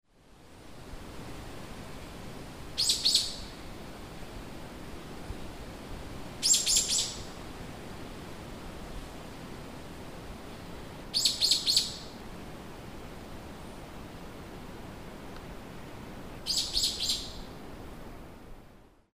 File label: Campylorhamphus falcularius Arapaçu de bico torto